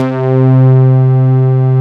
MODULAR C4, MODU BASS; Synth

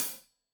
Hat Loose, Hi-Hat, Cymbals Stereo

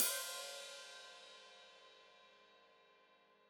Hat Foot Open, Hi-Hat; Deeply Sampled Drums

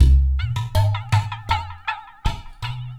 Udu; Sonic Foundry (Sony Creative Software); Ma Ja Le Ethereal Textures

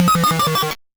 Noise A, 8-bit, Bitty Noises

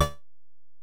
Bell, Alesis DM-PRO

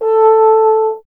BRS F. Horn, Solo Instruments; Miroslav Vitous; Solo Instruments VOL-2; Solo lnst. II

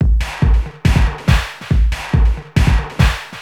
Beat, Industrial

